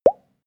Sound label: pop bubbles deep; koerpergeraeusche; menschen; soundpool